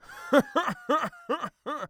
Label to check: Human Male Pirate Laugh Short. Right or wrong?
Right